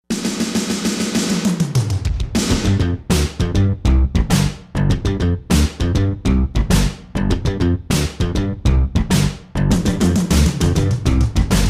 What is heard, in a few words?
piano instrumental